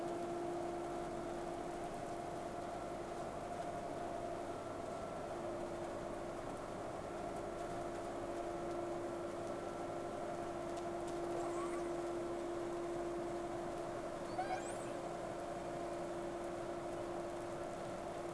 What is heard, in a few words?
Whales; SeaSnake